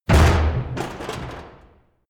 crash large metal box; objekte

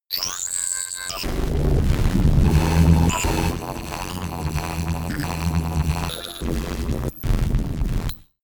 rumble glitch long, sciene fiction

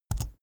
keyboard laptop enter press fast soft; kommunikation; computer

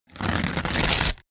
paper rip

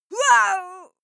female fight moan pain; schreie; menschen

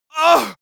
male hit

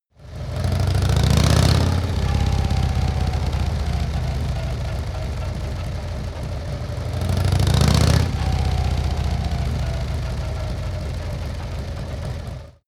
motorcycle harley accelerate triple short; motorraeder; verkehr transport

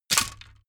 bamboo small drop; objekte; holz

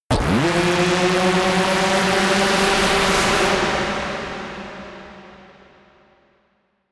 reverb rumble, risers

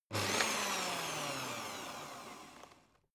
electric whisk rev; haus-garten; kueche

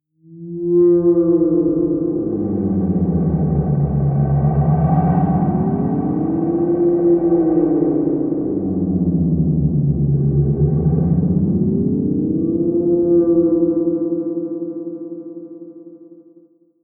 swaying drone; musikelemente; drones

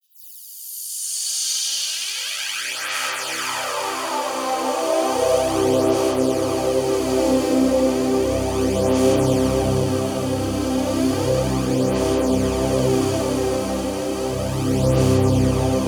pad sweep sizzle; flaechen

